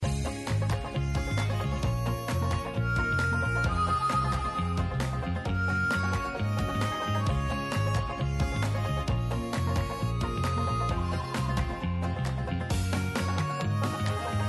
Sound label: BGM Bonus; Super Wheel; Outdoor America